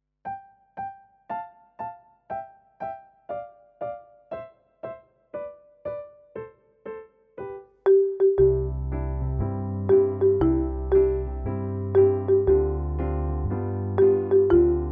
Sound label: guide melody backing